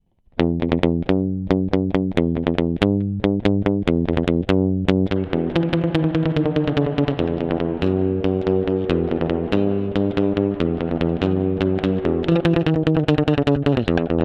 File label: Spring; REV mini